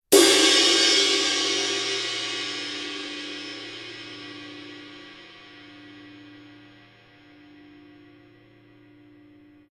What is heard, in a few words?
900 series 22 ride edge, paiste